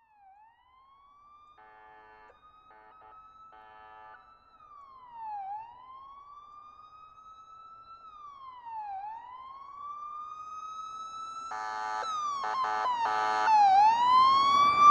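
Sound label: Chase with siren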